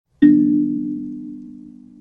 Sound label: call end